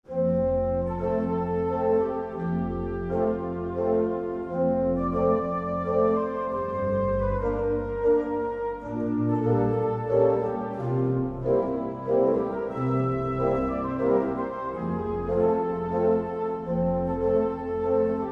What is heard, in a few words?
Sonata, organi; APPENINO BOLOGNESE; Becucci